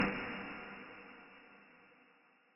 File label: short bright, High Impact; 3maze